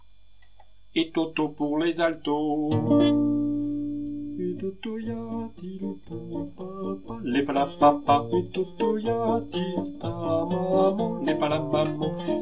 alto

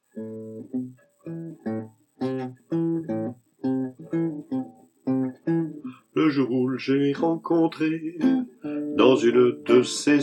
suzette basse, chorale

